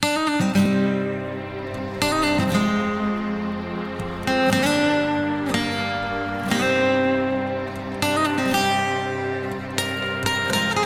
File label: stock music